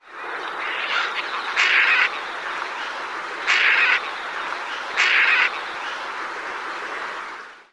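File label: harsh growling calls, Steller's Eider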